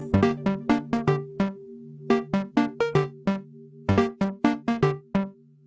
retro game music

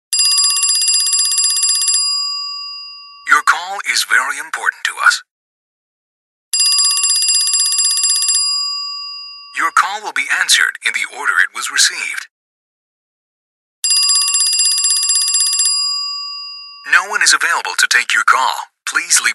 Old Ring

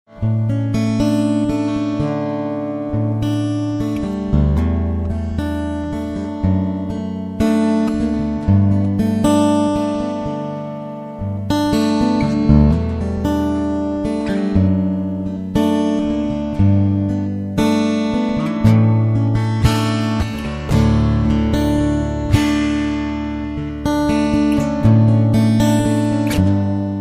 guitar lullaby

